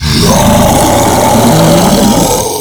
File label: Infested Ancient Taunt Scream; Warframe